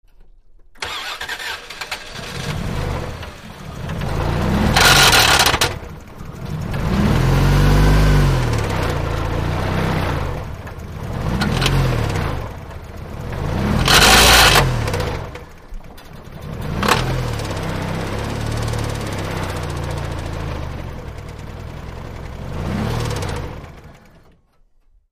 Car; Start And Idle With Very Heavy Rattles, Off, Side Perspective